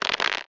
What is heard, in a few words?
Card Upgrade Shake; Golf Blitz 1.12